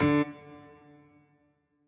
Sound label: piano riff intro